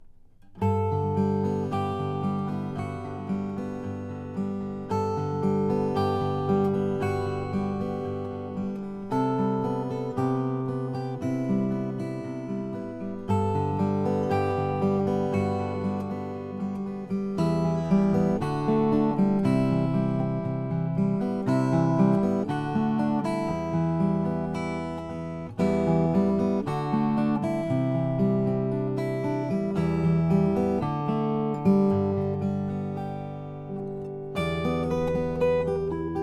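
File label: rainsong om1000nsx carbon fiber, guitars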